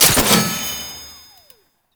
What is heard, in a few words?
Rocket Launcher Mech; Warframe; Tenno; Lasrian